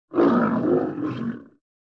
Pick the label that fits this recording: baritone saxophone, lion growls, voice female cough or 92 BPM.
lion growls